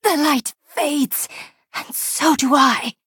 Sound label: last gasp; dunmer nightblade